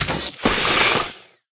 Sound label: Drill Mine